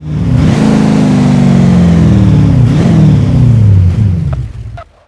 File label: throttle off, vehicles